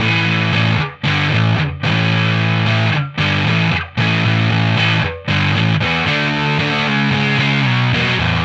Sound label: Dist Gtr